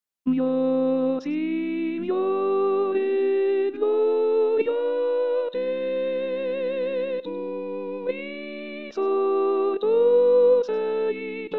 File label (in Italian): Soprani; Mio Signore Gloria a Te; pasqua